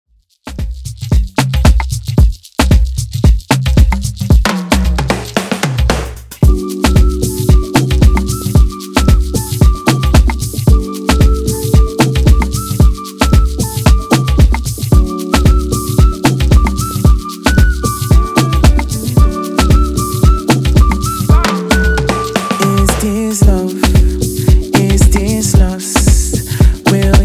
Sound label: Redrum 113Bpm